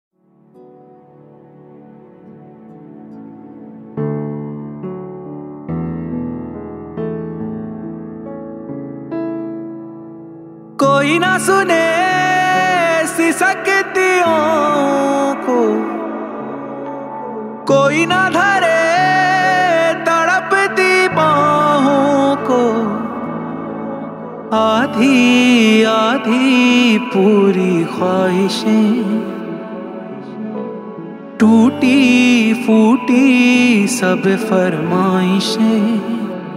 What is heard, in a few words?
Unplugged Version